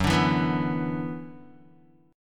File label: Gb 7th Suspended 4th Sharp 5th Gb Guitar; strummed; JGuitar